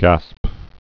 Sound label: gasp